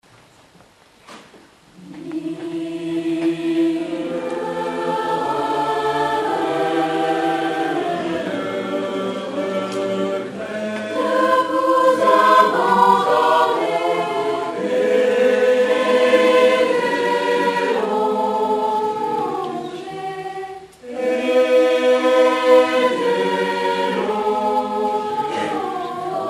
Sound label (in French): josquin mille regrets concert; live au miroirs